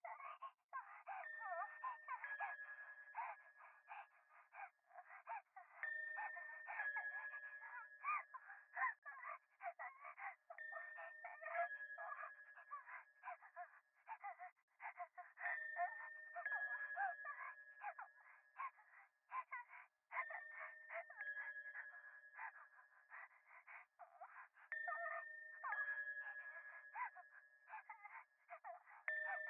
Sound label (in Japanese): 可愛い喘ぎ 神社, ASMR